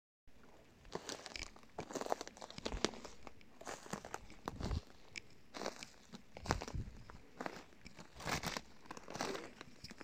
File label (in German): Schritte Unterholz; menschliche laute